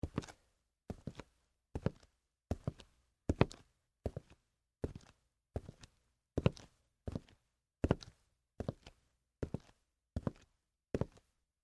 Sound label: Footsteps, Boots, Walk, Concrete; Human